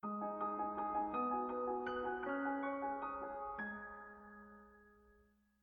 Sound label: intro music